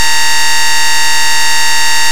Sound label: glitch appear; ゆめ2っき